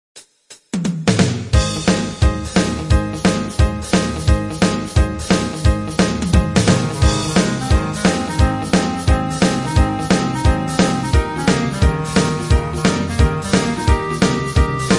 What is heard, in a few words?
instrumental klarinetten